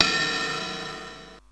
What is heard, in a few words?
crash single hit a sharp key; cymbal drum samples